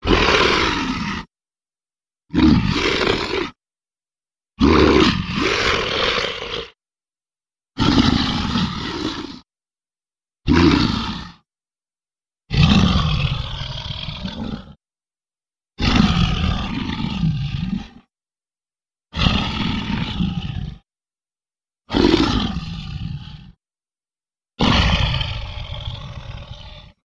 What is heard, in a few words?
Monster Breath roar